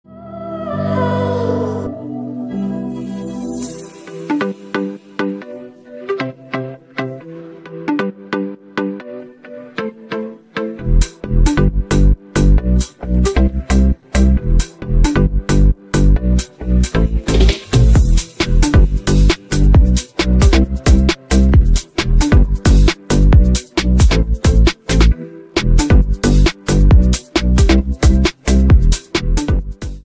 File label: instrumental